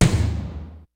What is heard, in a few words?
FUNK, DRUMS; Kid Nepro; Elite